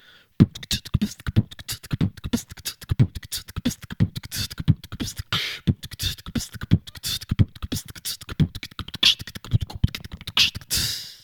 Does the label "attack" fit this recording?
No